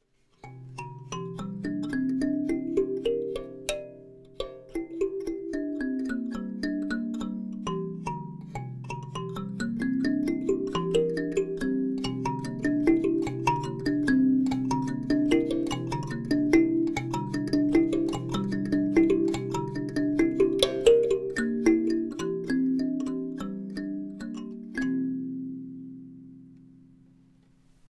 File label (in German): klangbeispiel daumenklavier